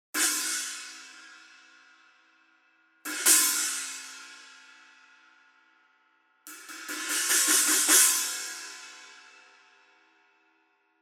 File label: China 14; Iris